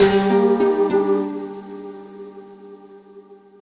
Retry Jingle; Friday Night Funkin Meet Nikusa ost pc mod gamerip 2022

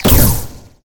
Sci-Fi Weapon Blaster Laser Boom Heavy; smashy road wanted 2 android ios switch gamerip 2020

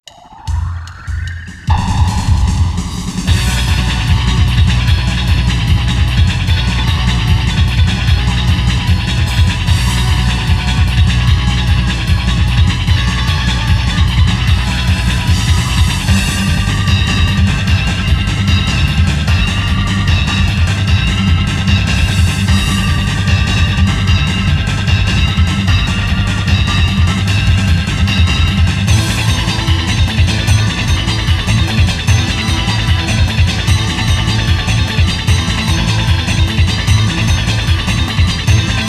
Metal Battle; Super Smash Bros. Melee Original Sound Version

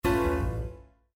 Cityscape Critical Stop, windows 7

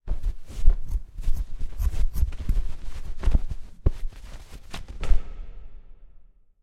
crafting hats; chicory a colorful tale; ps4

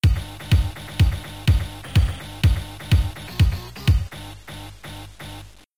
Bonus Stage Clear; Jazz Jackrabbit

